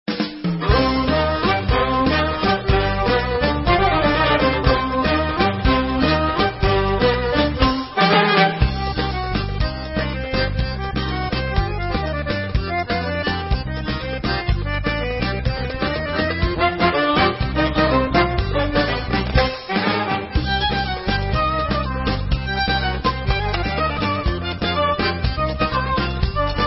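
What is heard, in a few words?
Instrumental, Israeli Dances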